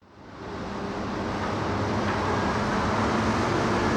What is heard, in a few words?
atomic turbine exterior starting